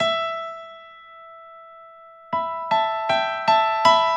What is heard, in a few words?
Synt